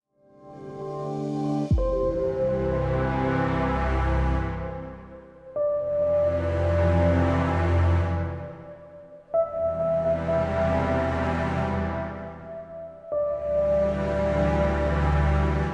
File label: stock music